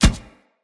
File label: Step Metal, Footsteps; Kubrow